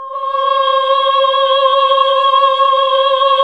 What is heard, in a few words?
OHH C, Nuns Ooohh; Sound & Vision - Gigapack II